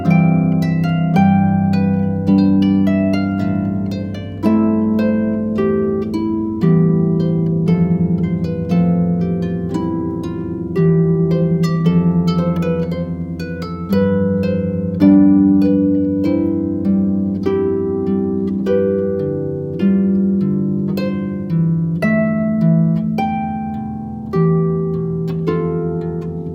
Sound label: celebrated canon harp